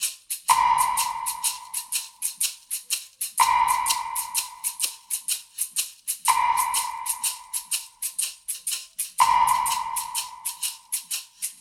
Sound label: perc loop tambourine shaker block 3-4